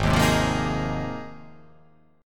Ab 6th Add 9th, chord; strummed; JGuitar

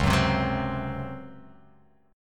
C sharp Major 7th Suspended 4th C sharp

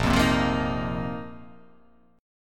A sharp Suspended 2nd Suspended 4th down

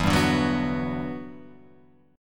E Minor 9th, down

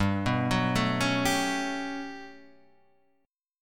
G Major Sharp 11th G down Guitar Half Step Down

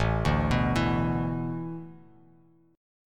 A Suspended 2nd Suspended 4th, Bass Standard; Asus2sus4 arpeggiated

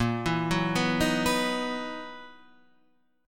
F sharp 13th A sharp

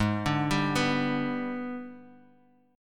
Ab Minor Major 7th Flat 5th, down; AbmM7b5; arpeggiated

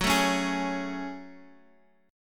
F sharp Minor Add 9th F sharp; strummed

